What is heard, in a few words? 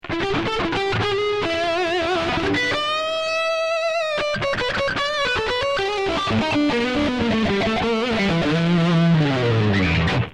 solo